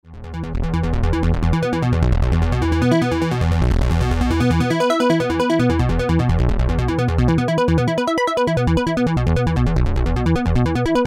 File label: arpeggio